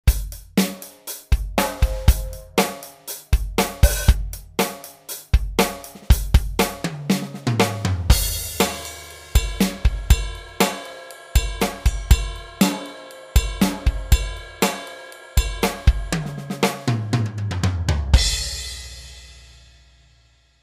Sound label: td 20s pop